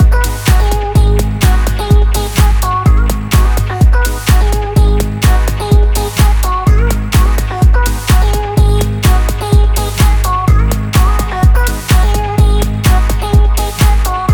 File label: DEEP GROOVE